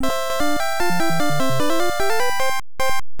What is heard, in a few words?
Bonus Wins (1up); kid kool nes